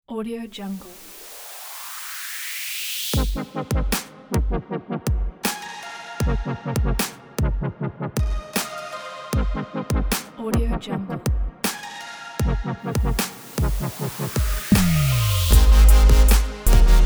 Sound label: lazy chill; electrohamster